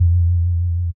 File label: 808s